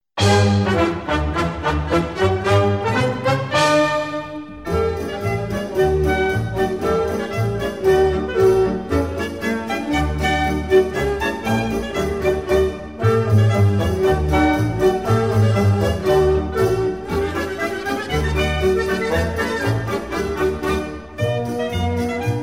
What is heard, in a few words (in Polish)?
krakowiak